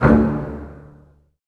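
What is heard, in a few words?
Bass Spiccato D1; Digital Sound Factory Virtuoso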